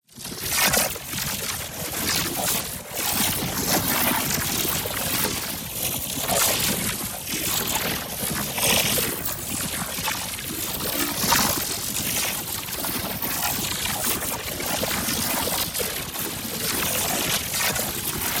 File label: ABILITY CHARGE Electric Flux; boom library; Matter